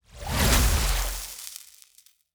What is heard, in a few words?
Water Sphere Explosion; TANK; 3Souls